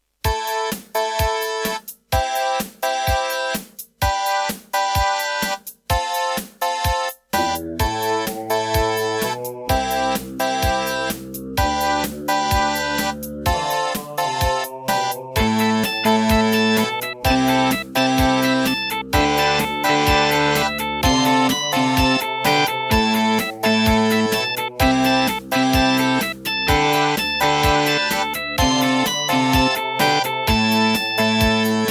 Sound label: Serdaco Crystal Blaster C1; raptor call of the shadows